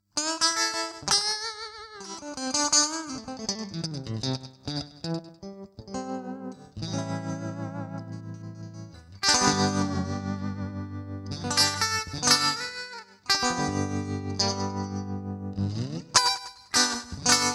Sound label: Country